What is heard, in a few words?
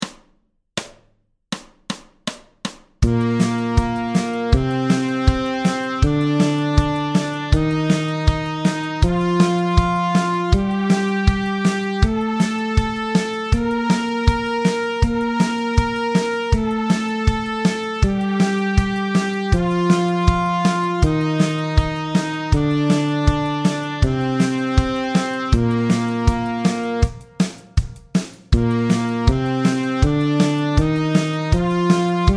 Bb Major Scale Fast